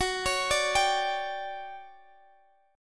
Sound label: D Major 11th F sharp, chord